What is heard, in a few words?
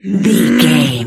voice female growl frustrated